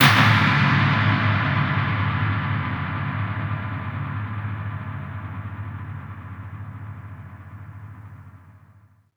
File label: HITS, Special FX Twisted; USB Soundscan; AKAI